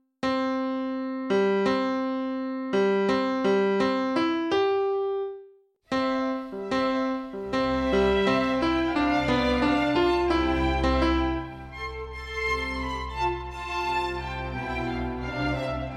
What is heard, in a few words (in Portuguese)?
fanfarra